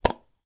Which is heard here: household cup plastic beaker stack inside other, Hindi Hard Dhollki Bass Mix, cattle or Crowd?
household cup plastic beaker stack inside other